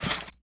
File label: armor